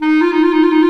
THE CLARINET

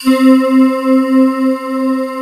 D50 OK CHORA, CHOR; Best Service; Alpine Volksmusik

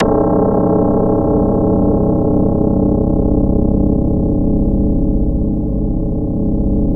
false wire, Twisted Textures

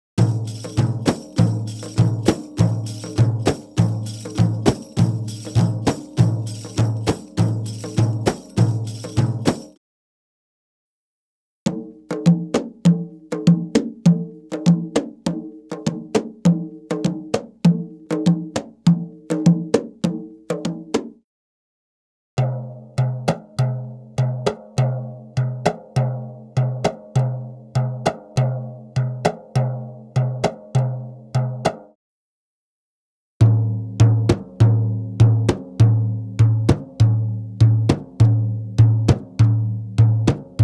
Arabic Rhythms

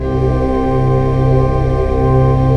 JV CHURCH, JV-1080; Syntec; Wall of Sounds

